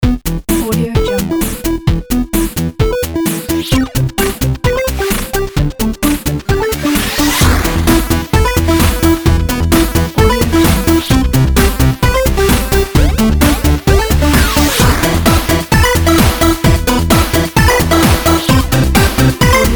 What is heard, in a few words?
8 bit chiptune ident logo